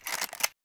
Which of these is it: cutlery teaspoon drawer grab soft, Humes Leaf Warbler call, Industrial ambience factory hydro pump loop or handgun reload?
handgun reload